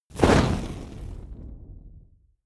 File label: campfire small inflame